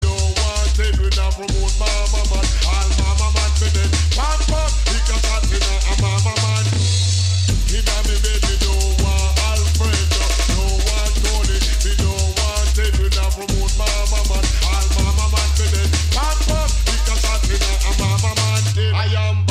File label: tone; jungletrain